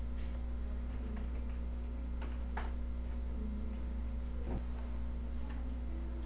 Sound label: shot